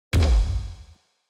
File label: Robot Step